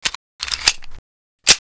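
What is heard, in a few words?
reload, revolver; doctor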